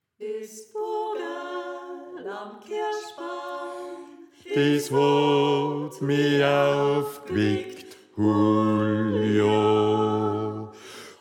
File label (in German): Bass; Des Vogerl Am Kerschbaam